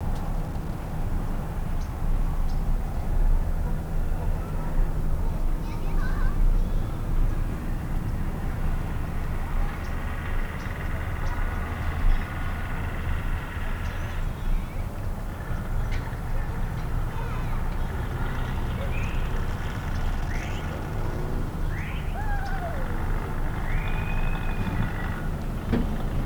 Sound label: neighborhood, Neighborhood Amb; E-MU Producer Series Vol. 3 – Hollywood Sound Effects; Ambient Sounds